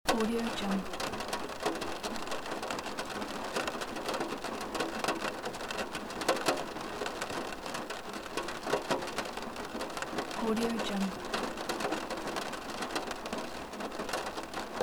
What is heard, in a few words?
rain on car window; sviridpavlov